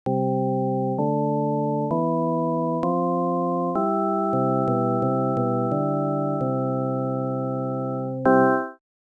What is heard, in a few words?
Boot Tune Organy; BatterUp